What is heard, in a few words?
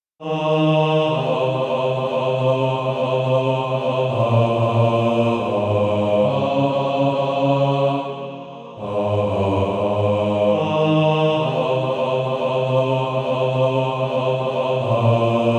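Male Choir, bass 2; On Christ the Solid Rock I Stand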